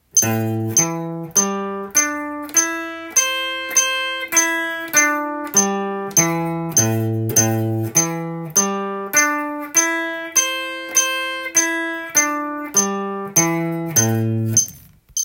easy picking, guitar; easy picking practice tab

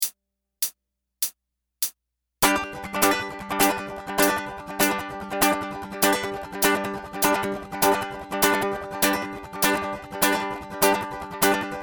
ukulele rokuren

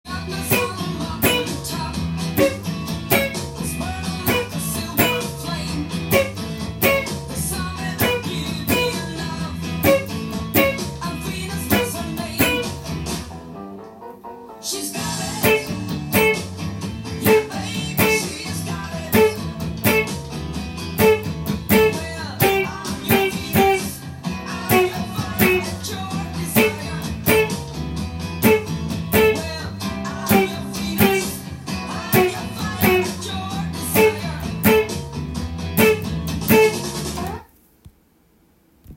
side guitar; how to create rhythm guitar parts; venus

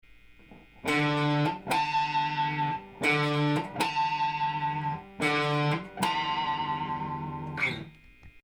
picking, guitar

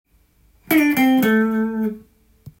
blues, guitar; blues short phrases tab

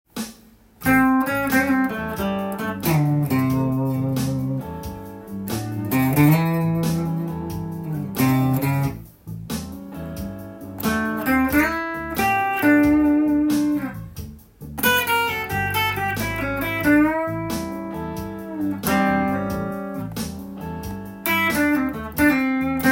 major3rd blues; a blues solo that includes a major third chord

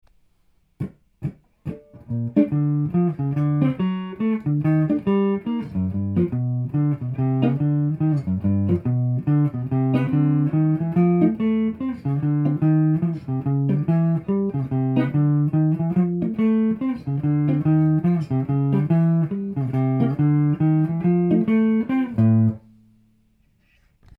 bass

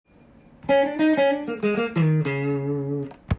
minor major penta, guitar

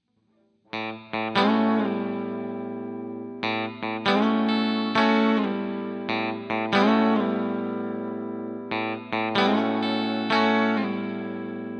hard guitar chord

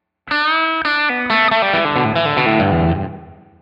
E blues, guitar

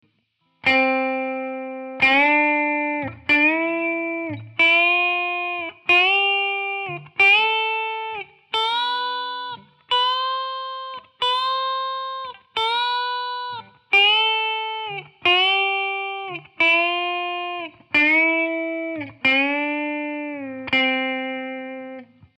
tyo king guitar

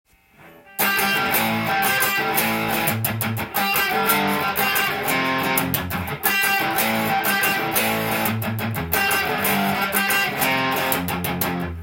riff, guitar; how to create guitar riffs; musictheory

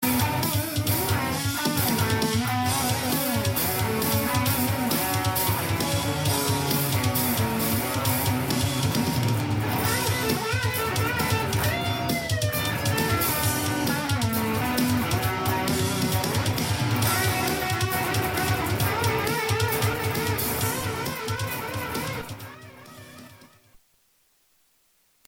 metal sesstion; heavy metal session high school girls

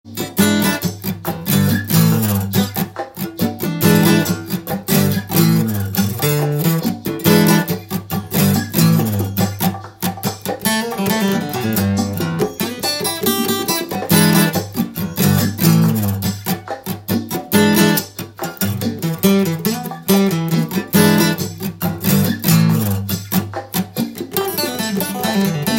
percussion and rhythm section

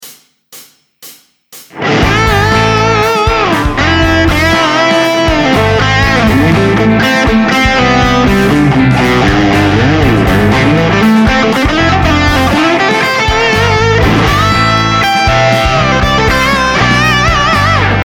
guitar solo

